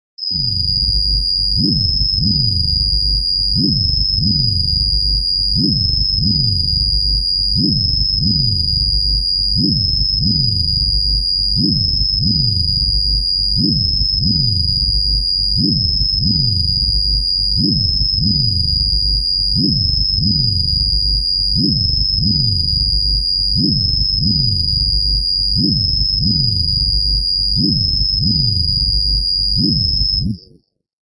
Evil Cricket Bass, Deep and creepy evil bass sound; LOW FREQUENCIES; Deep Tone